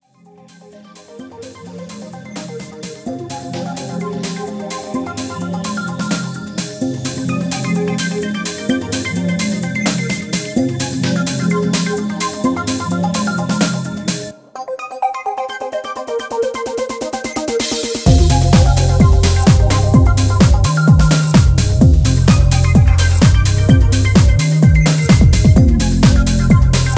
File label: Laughing Tones